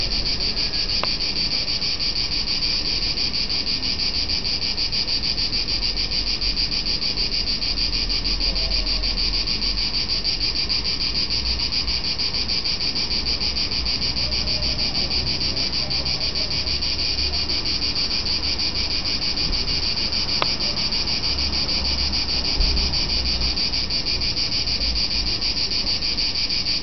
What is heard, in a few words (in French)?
Enregistrement Cigales; ce n'est effectivement pas une Lyristes mais bien une Cicada